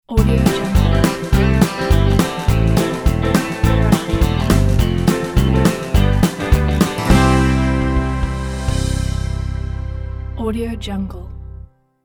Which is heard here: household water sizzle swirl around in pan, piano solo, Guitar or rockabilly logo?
rockabilly logo